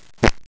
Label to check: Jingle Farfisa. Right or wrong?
Wrong